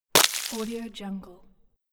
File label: ice shatter